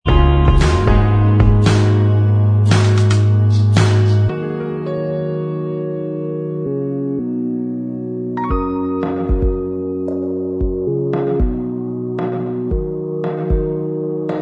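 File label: instrumental, backing tracks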